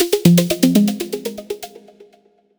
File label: Fashion Synthetic, notifications; Meitu; M2